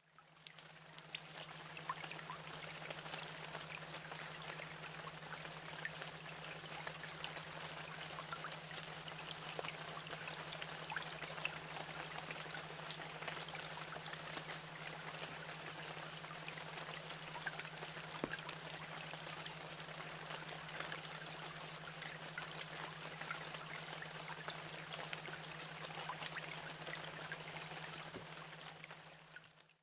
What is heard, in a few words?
binaural beats rain on foliage; sleep loop